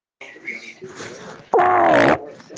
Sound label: angry fart